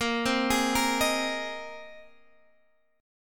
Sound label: Bb 7th Suspended 2nd Suspended 4th Bb